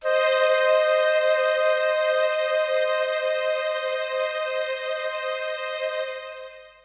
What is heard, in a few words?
Orches, Techno Melody; TechnoMusicMaker